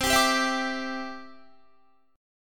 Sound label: C Major Add 9th, Guitar; Cadd9 strummed